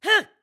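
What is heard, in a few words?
Jump Attack, voice; BOTW; Breath of the Wild; Link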